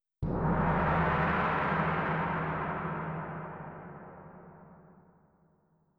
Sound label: gong; Netease